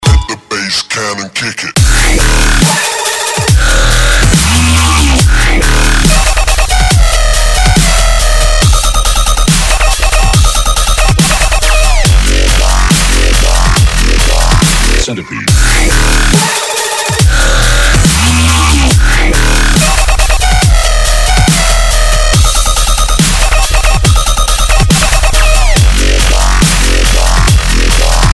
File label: bass